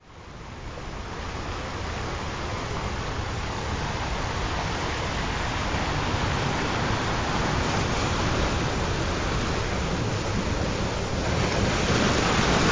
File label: ocean sounds